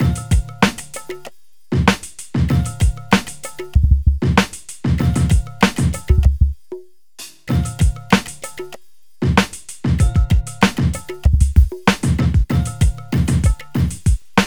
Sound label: Drums, Hip Hop